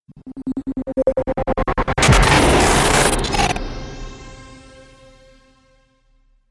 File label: Trailer Hit Glitch; Trailer Sound Effects